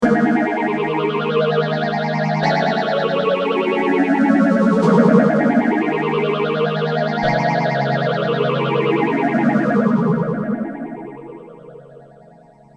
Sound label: m1000 sph323